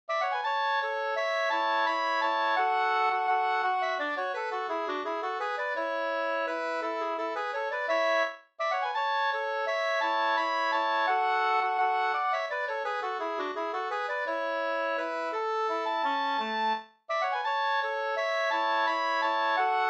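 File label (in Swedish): vals Adur, two-part; Adursvalsen e Arvid Pettersson Hummelkarr Sd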